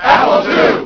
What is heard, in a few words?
Apple II Yell